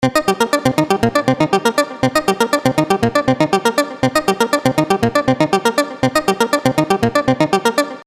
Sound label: Switch up Arp